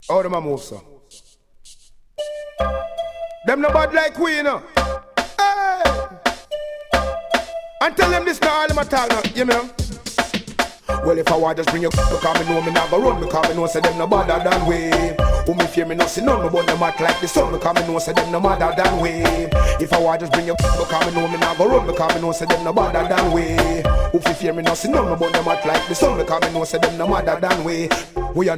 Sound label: riddim